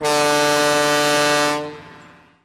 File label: Boat Horn, Honk, Full